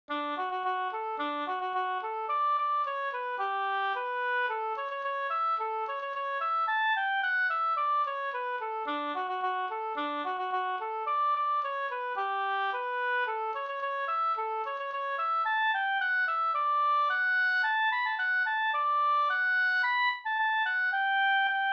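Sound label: polka, D major; fr Roros no Ddur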